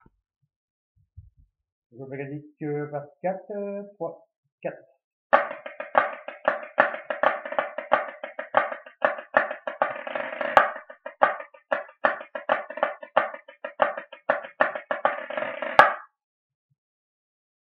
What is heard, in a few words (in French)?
SOLO BAGADIG